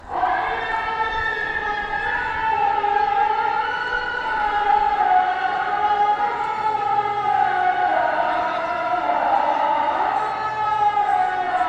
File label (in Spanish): estambul mezquita azul; turquia; Oído Absoluto